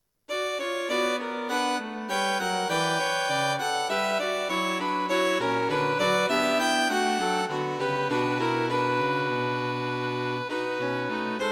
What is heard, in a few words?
String Quartet; String Quartet for Concert performance